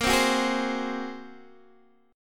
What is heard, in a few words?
A sharp 7th Suspended 2nd Sharp 5th, Guitar Open D chord; x,13,10,12,11,10; down